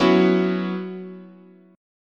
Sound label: Gb 7th Suspended 4th, chord